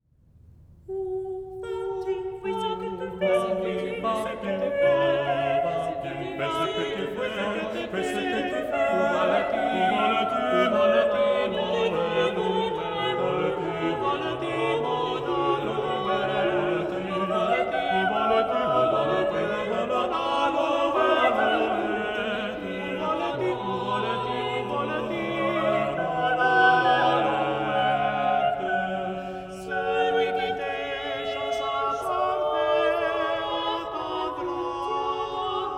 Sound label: Polyphonic rondeau; Ov va tu